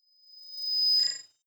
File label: sci-fi beep fade in high